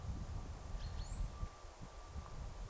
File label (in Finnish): hippiaisen versio taigauunilinnun aanesta